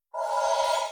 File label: shield bash effect